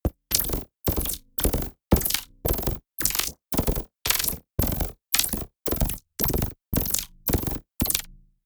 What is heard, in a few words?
sci-fi machine gun